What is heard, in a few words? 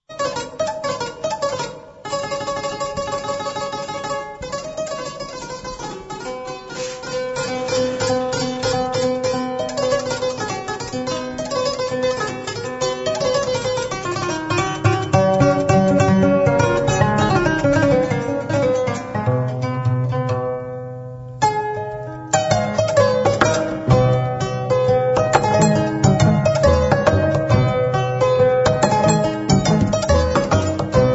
Kora from Gambia